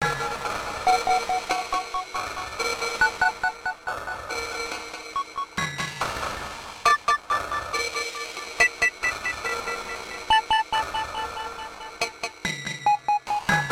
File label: Transition Space Add Perc F#; Bust n Rush